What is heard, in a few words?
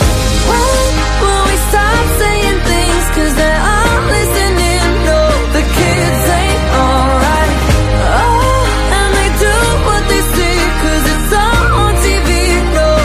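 guitar english music ringtone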